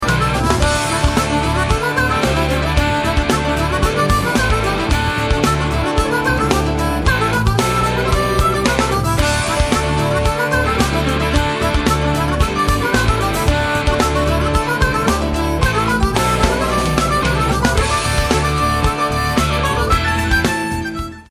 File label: Melody